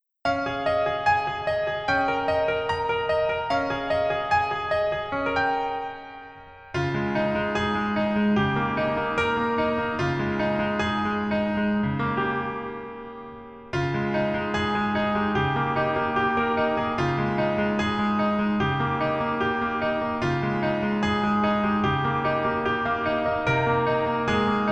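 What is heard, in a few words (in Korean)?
Piano version 멜로디MR -1키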